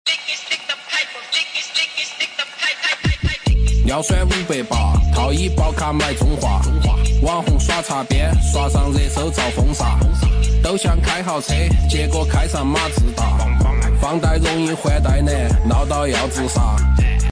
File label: chinese rap